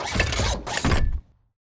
combat run turn; Titanfall 2

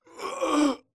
wall slide grunt; Titanfall 2

